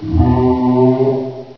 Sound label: ichy pain; Source Engine; bshift